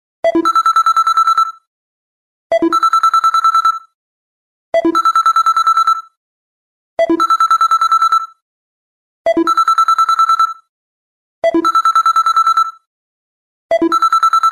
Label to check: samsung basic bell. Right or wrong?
Right